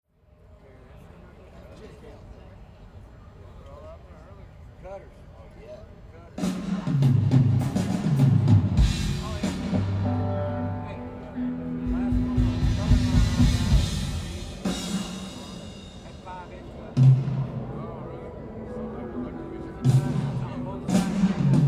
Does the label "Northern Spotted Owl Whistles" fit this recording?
No